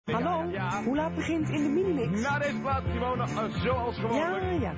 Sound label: jingle